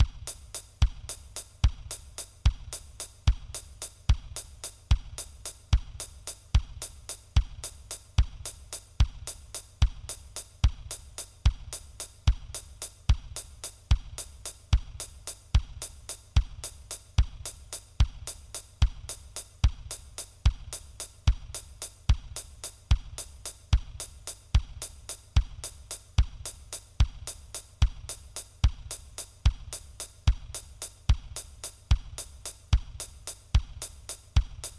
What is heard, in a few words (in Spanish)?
vals